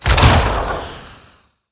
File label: Dragonbane Attack